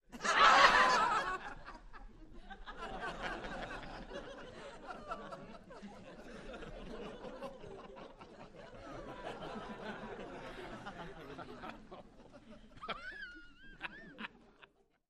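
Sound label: Crowd 50 Short Subdued, small interior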